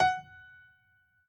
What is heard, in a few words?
piano hi